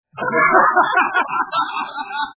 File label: laugh, crowd